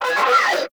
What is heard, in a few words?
Scan Vocal; Frumentarius